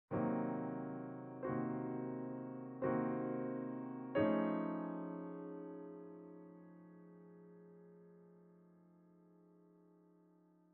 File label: Museum Boss Piano; unsighted